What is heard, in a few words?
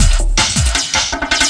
LOOP, 160 BPM, Drum & Bass